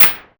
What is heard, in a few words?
Synth Clap